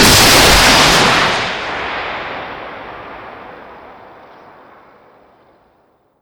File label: Tow Launch; Weapons; DCS